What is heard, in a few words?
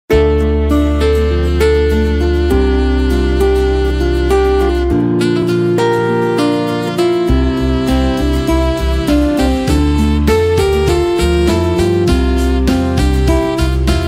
ringtone instrumental saxophone